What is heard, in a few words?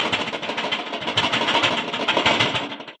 turn